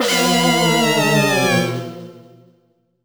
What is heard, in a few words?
Shock Chord C; the legend of zelda spirit tracks